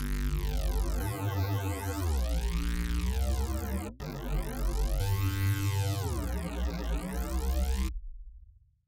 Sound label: processed synth in stereo; 将Stereo旋钮调至100; Phasis; 拓展其声场